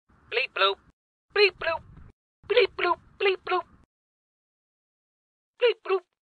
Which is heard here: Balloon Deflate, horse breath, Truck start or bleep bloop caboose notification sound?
bleep bloop caboose notification sound